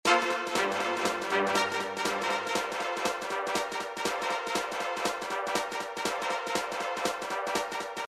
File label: lounge